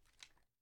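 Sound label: assault rifle inspect, weapons; gmod; viper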